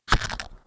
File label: Thud